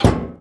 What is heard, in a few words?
close window